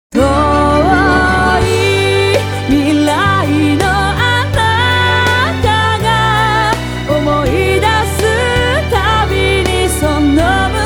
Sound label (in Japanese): 女性楽曲サンプル